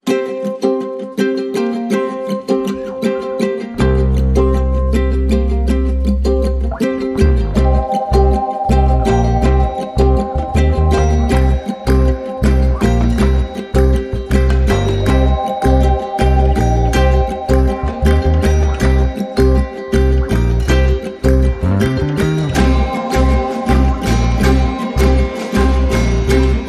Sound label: No Guitar